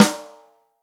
ROCK SNARE CHROMATIC, Real Drum Kits

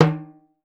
REPIQUE, Percussion; Voice Crystal - Steve Reid Definitive Percussion

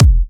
Dubstep Kicks; Bassdrums; Vengeance - Essential Clubsounds Vol.5

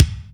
Kick, Akai MPC500; Toolkits

